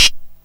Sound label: SYNTH HAT; Best Service Alpha Dance vol.2